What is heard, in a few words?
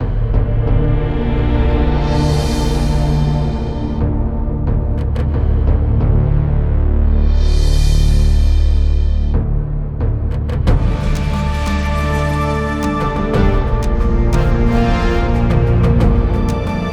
C15, deep, pad-like sounds; The banks provided here are collections of original presets; this bank covers a wide range of functional and charismatic sounds, with an emphasis on deep, pad-like sounds.